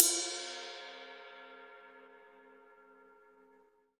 GATE RIDE, Drums Acoustic; USB Soundscan vol.10; AKAI; 90s sample CD; GATEKIT 1

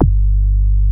MOOG HI G1, ANALOG BASS; Music Store - Claudius Diemeter Schwerlos

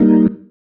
Stab C, Organ, One Shots; Stabs; Sonic Foundry; Joe Vitale Organ Donor

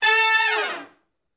Hi Short Falloff (A) uni, Horncraft for R&B; Sections; Crimson Blue and Fabulous Horncraft 4 RnB

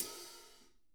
hats, Drum Kits; Sonic Reality

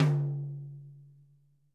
tom, drum kit

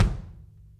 kick, drum kits

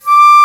Flute mf D4; Digital Sound Factory Virtuoso

